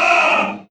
pain; svencoop; morass beta; de man

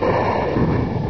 baby panther die; svencoop; custom monsters

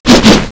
colt draw; svencoop; scshogo

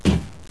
player metal; svencoop; sence; mistake coop

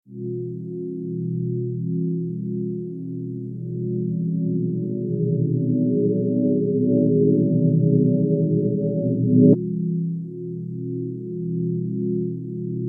unknown room loop; svencoop; rustworld

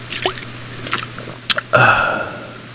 suit charge ok; svencoop

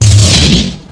tech; svencoop; scshogo

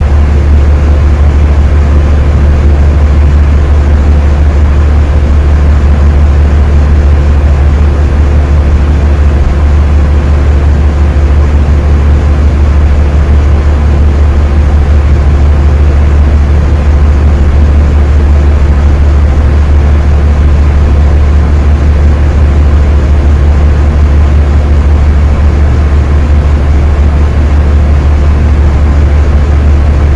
vent rum, ambience; svencoop; aomdc

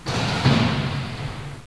buzzer; svencoop; sence; mistake coop

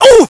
pain; svencoop; scshogo; fallenofficer

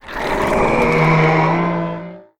worm alert; npc; svencoop; morass beta

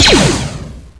GAUSS2, weapons; svencoop

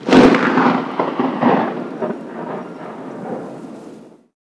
thunder attack; monsters; svencoop; AoMDC; davidbad

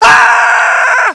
death; svencoop; scshogo

